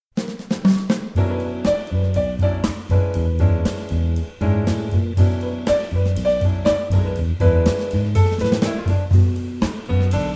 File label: Gospel Groove